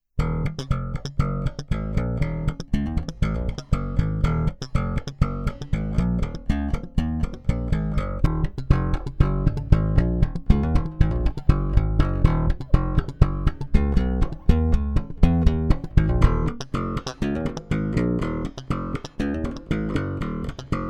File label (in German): Slap Mittig Hals Brücke